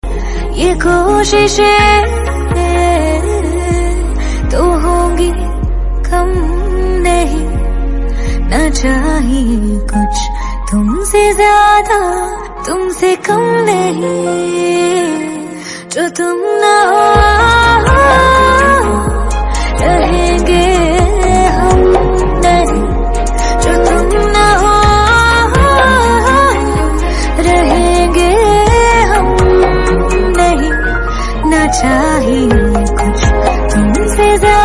Female Version Ringtone

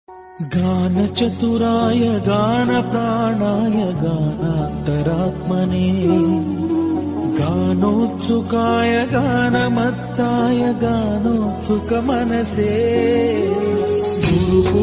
Bhajan Ringtone

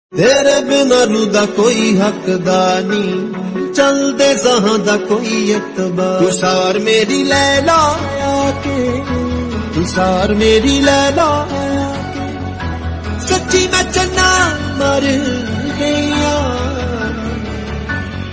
Punjabi Song Ringtone

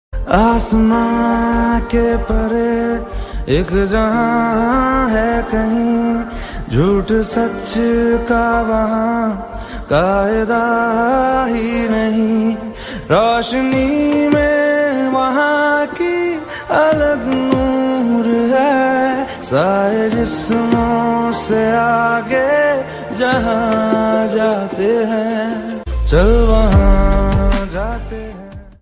Best Hindi Love Ringtone

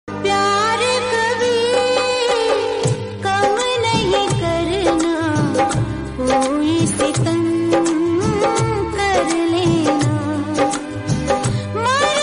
Female Ringtone

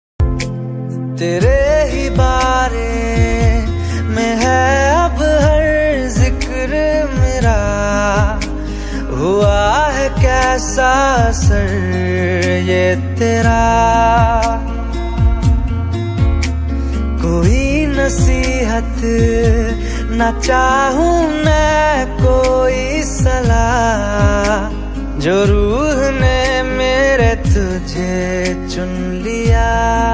Love Ringtone

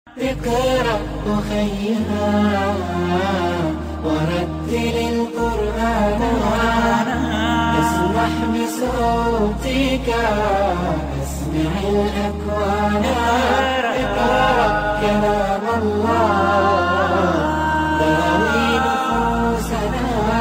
Beautiful Arabic Naat Ringtone; IQRA

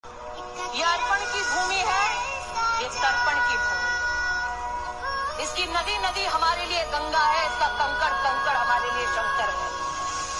Independence Day Ringtone

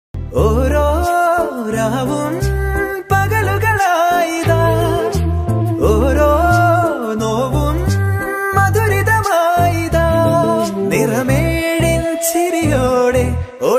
Malayalam Love Song Ringtone

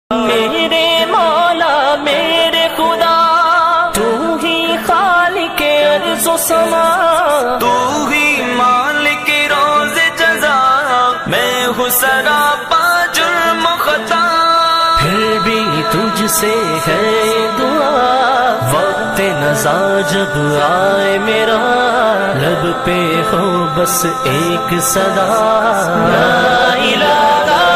Islami Ringtone

